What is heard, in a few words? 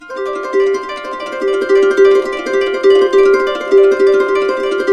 harp, -L; Complete Harps; USB Soundscan vol.62; AKAI